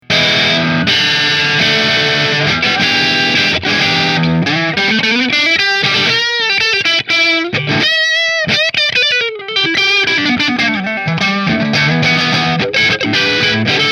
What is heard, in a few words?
Single Maple Neck Marshall, guitars; Rahan Guitars RP Single Cutaway Figured Maple